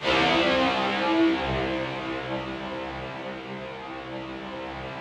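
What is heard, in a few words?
Rough Strings C1, Yamaha VP-1; 90 s Sample CDs; Music Store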